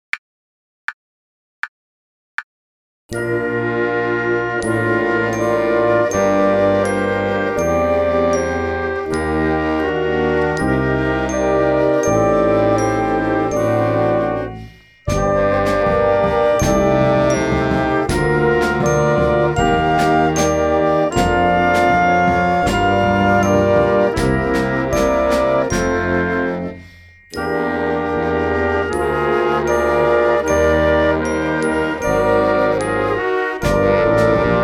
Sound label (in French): à la claire fontaine (moderne) noire à 80, accompagnements